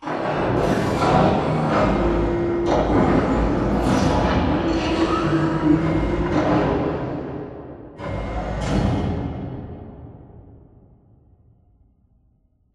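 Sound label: Assembly Wall Arm Distant; Boomtown; Titanfall 2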